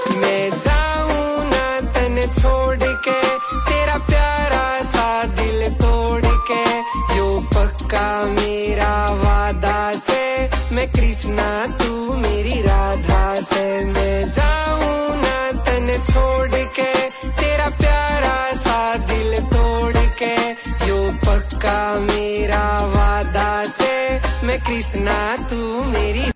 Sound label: Ringtone